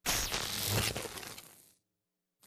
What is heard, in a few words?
arc titan sizzle; Titanfall 2